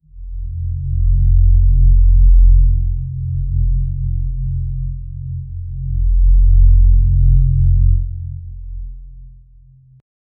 deep cinematic low freque; dark fantasy

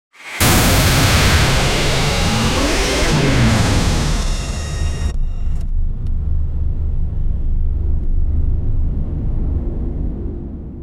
colossal spacecraft ignit, blastoff

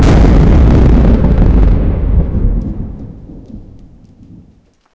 raging thunder energy con

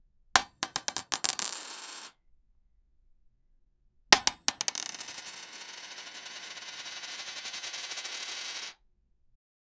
coins clacking and fallin; money

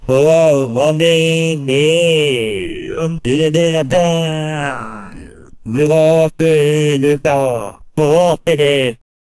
chant; bahubali

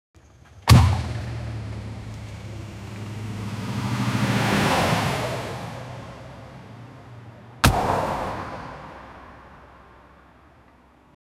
a sudden jarring impact as two vehicles collide; crash

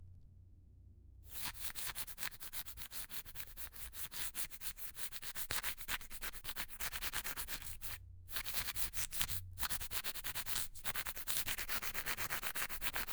asmr sticky ear cleaning

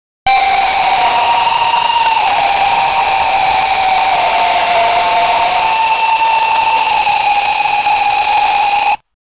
screamer; screamer of springtrap; fnaf

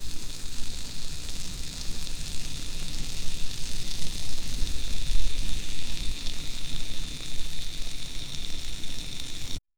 occasional bubbling of fa

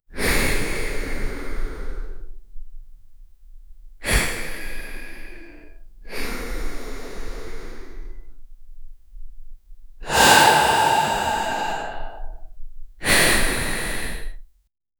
slowly exhale through nos; breath

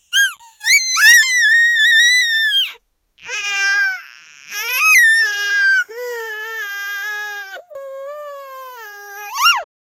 short distressed baby cry

